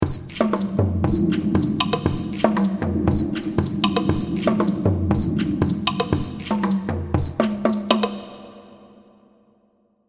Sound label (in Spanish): las percusiones; mesoamerica